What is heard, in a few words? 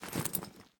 minion armor; smite; npc; roman archer